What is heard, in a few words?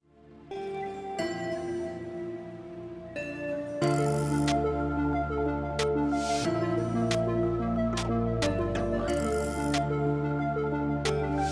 Relax The Dreamy Atmosphere Electronics nightcore